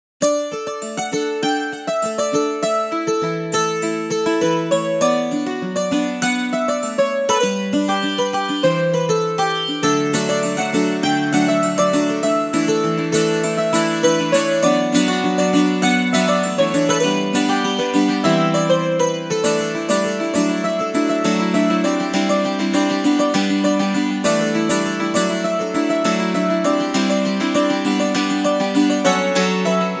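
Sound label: mountain dulcimer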